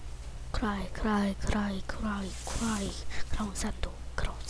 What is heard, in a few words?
cry